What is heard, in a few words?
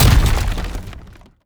strider step; Strider Remake